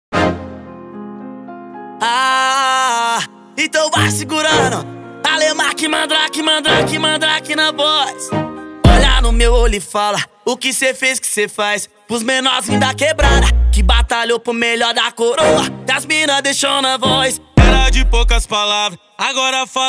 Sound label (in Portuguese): funk e sertanejo